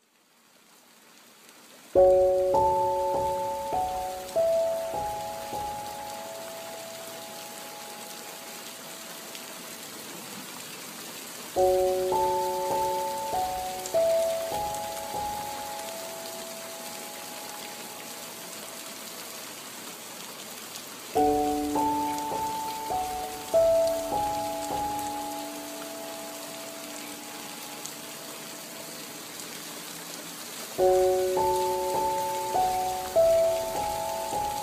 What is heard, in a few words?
Rain and piano